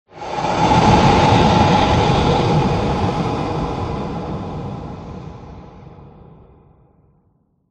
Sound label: Whoosh Turbulent; horror and atmosphere fx; Silent Hill Downpour